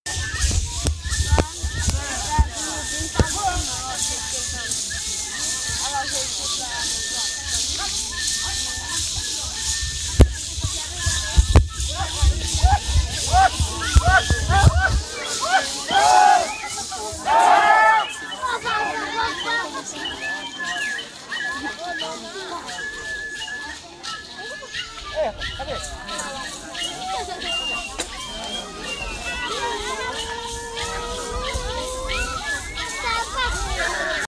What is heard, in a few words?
pankararus